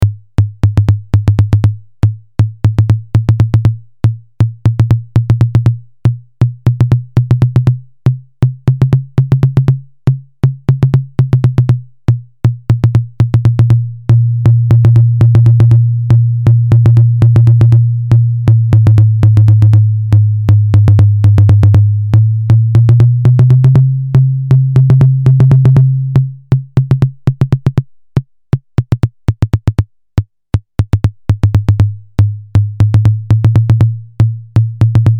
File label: TB2 CONGA